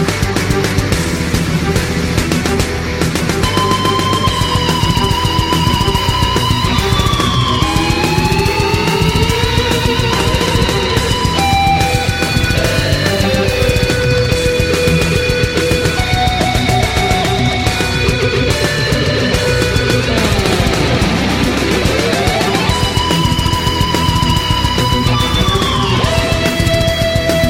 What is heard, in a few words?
Metal; Doctor Who